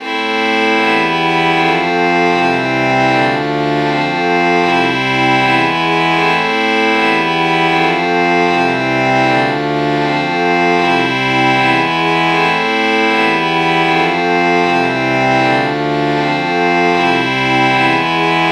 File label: violin violin lpc true chord morph